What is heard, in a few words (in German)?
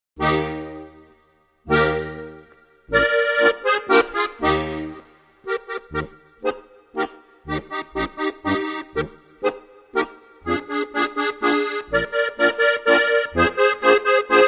plattler